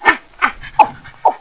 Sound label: patas monkeys